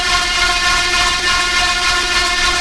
hover, engine; zafir

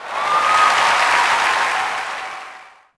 POS Reaction,Crowd; Cars; XBOX

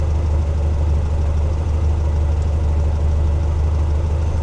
car idle; Matt Hoffman's Pro BMX 2; XBOX

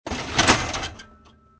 track switch; Soldier Of Fortune II Double Helix; XBOX

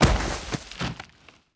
bodyfall grass, foley

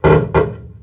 creak; WhiteOut

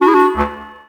Pickup

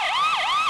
police car siren

